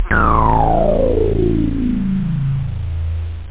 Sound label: 303 lazer; tb303 samples